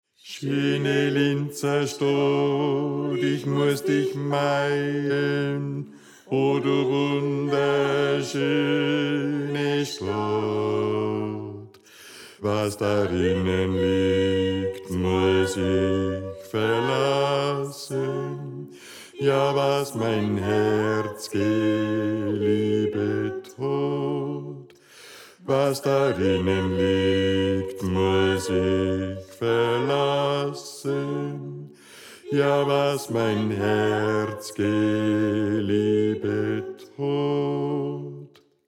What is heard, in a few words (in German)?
Bass; Schöne Linzerstadt